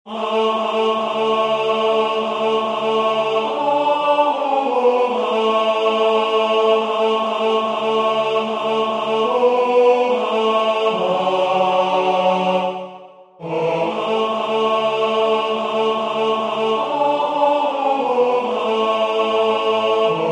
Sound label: tenor